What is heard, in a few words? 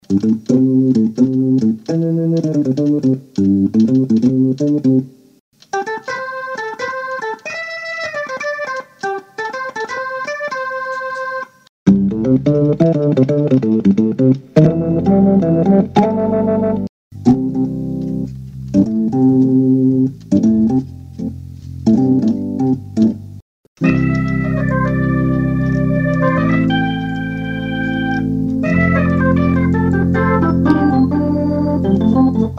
tubepreamp, hammond; t-mod